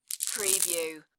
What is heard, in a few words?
Marbles Or Pebbles Rattle